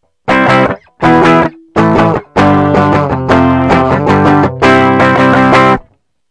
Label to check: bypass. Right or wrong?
Right